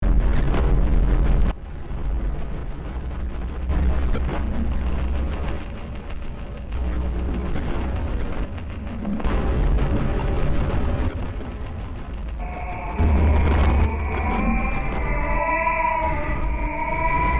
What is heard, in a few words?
Water Sweep Noise W Opera Bkwrds Full Song